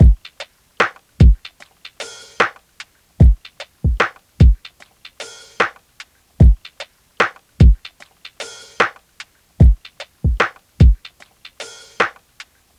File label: Drum Loop, 75 BPM; Cymatics; Eternity Sample Pack; Full Drum Loops